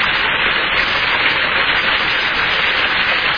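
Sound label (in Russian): водопад шумный далекий монотонный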